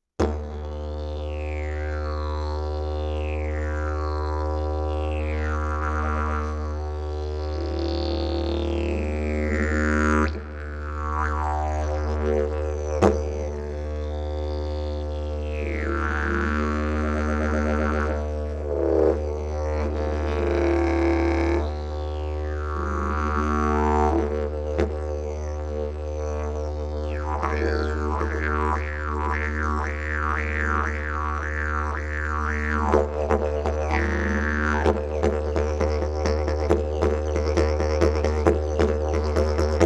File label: didge; didgeridoo 607 key d